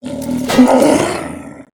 Attack; ShadowPawn

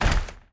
Blood Assassin move

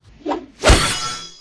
twin attack

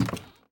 swamp Dark bridge; SwampOfDarkness

